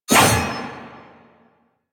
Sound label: Sword Whoosh; Enemies; Weapons; DE; Warframe; Teshin